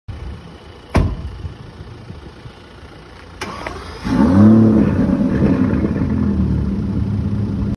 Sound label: Aston Martin, اگزوز